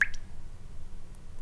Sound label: drip; gesource